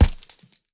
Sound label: Punching Percussion, Straight Glove (Chain), raw; 99Sounds